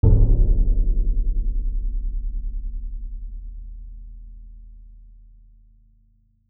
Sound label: Hit Big Drum; IMPACT; Baxter Audio